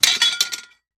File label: Knife Drops To Ground; knives